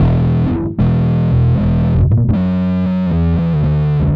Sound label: BASS; Evolution 074